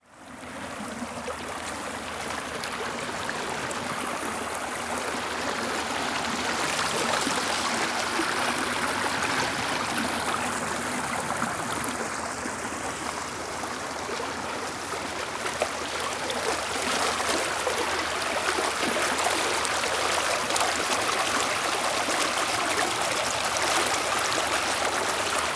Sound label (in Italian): acqua SFORZESCA MARCITE